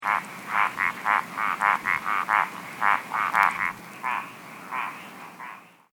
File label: Arizona Treefrog - Hyla wrightorum; formerly Mountain Treefrog; Adult, Coconino County, Arizona